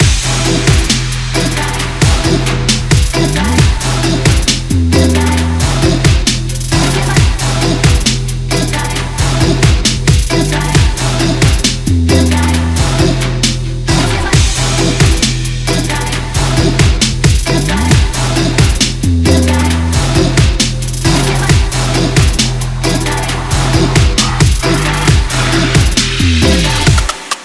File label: TRAP LOOP bpm134